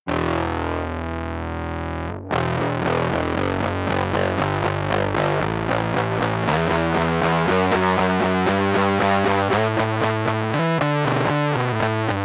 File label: BIG MUFF